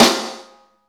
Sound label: snare, Roland